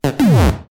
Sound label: Explosion; SMB3